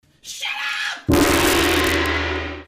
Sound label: gong; wub; shutup